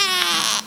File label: creak